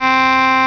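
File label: Crumhorn A; Instrmnt; Sound Library 2000; Wayzata Technology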